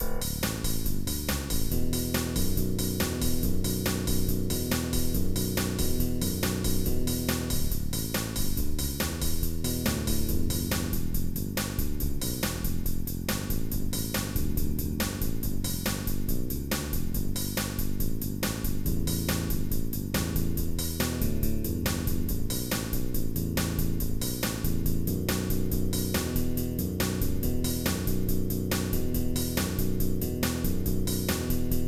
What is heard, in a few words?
drums